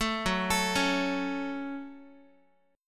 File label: Gb Minor Gb Guitar; arpeggiated; JGuitar